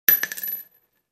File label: Shell casings fall on concrete